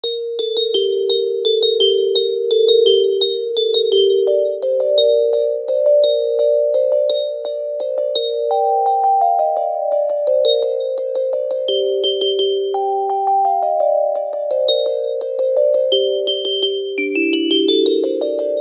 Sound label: Carol of The Bells, cisco ring